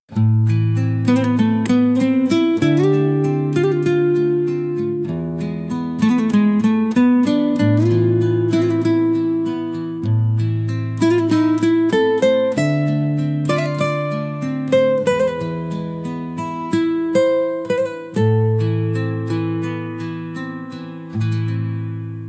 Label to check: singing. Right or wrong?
Wrong